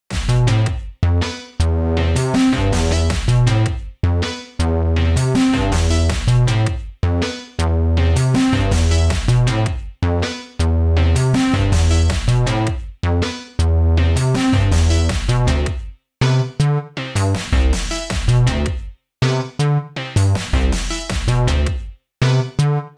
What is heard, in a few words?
Techno Mix